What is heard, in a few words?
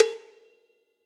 Cowbells Reso Classic; Drum Hits; Ableton; Factory Packs; Session Drums Club; MPE Hits